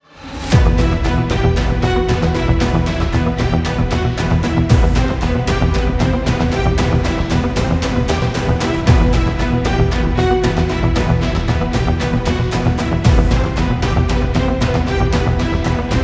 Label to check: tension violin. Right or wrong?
Right